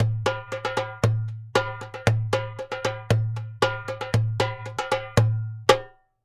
Doumbek, Arabic

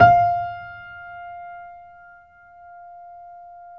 MF F5, GRAND PNO

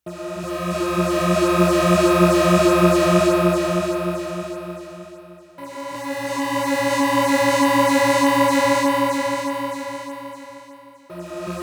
Melodics; neuro; Optiv & BTK

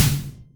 Roland MC09 Snare

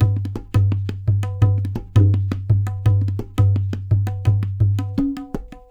LOOPS, Percussionworks

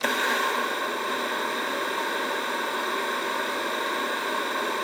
luft, alto sax; Xsample VOL-2

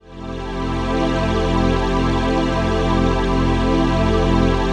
PHASE PAD

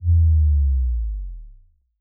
FX BASS DROP; Electronisounds

